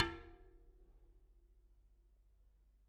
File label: Bicycle Spoke